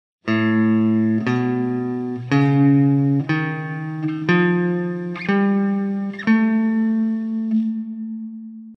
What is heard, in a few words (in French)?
Tons